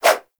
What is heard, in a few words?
Generic Bow Load Whoosh; Warframe; Foley